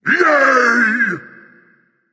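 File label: non verbal yay, voice; cernunnos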